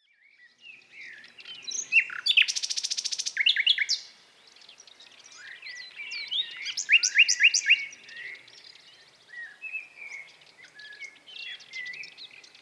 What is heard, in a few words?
Common Nightingale Luscinia megarhynchos; chant des oiseaux des jardins